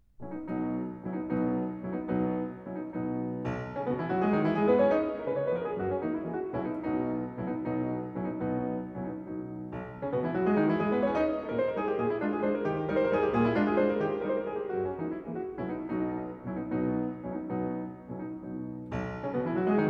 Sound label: Mazurka in B, Piano